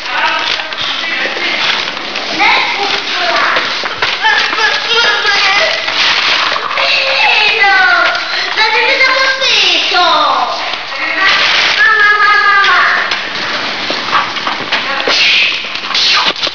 Kinder

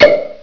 KORKEN

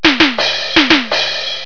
TUSCH

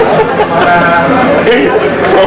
Kneipe

